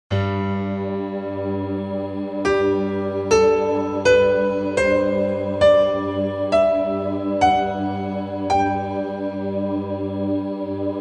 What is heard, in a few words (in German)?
G Durtonleiter finden